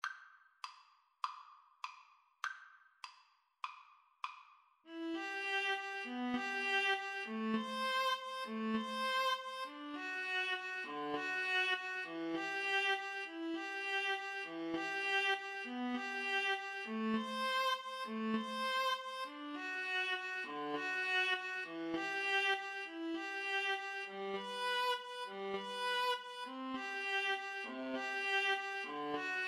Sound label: horses, Violin Viola Duet